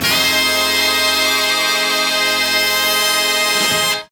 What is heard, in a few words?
HIT Band, Big Band Hits; Club-50 - Foundations Roland; Hit Combo